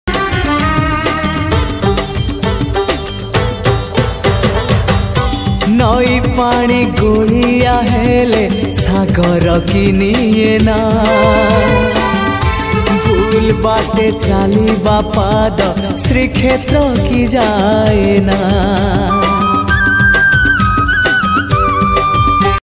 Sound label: odia Jagannath bhajan